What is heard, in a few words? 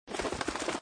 Butterfly Move; mystic training